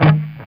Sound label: E 4, Soldano rock guitar; Spectrasonics; Hans Zimmer Guitars VOL-1